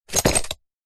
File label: sport body builder belt catch